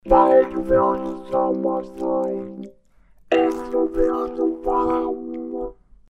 Dunlop MXR M222 Talkbox epiano akk

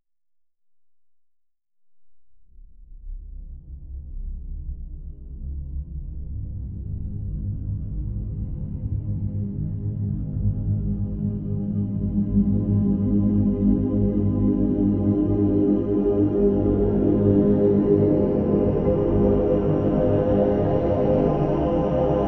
OB-8 pad granulated plus filter; TastyChips GR-1

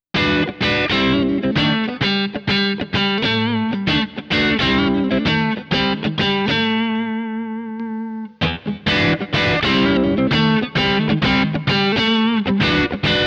Spaceman Artemis 3 Band EQ

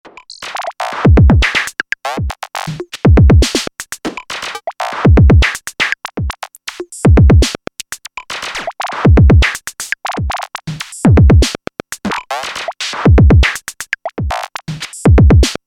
Drum Sound Variation; VCV

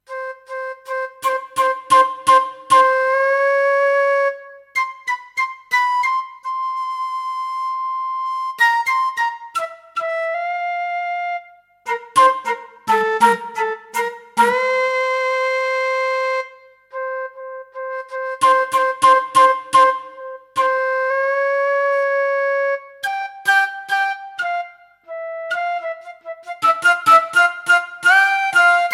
Flute

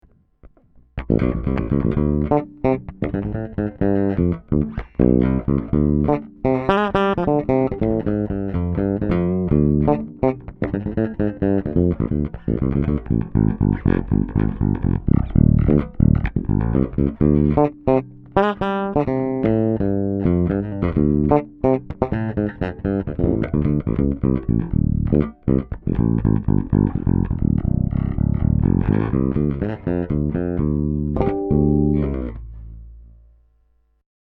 IBZ SRX756 Bridge PU Passive

